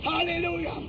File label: hallelujah; Rain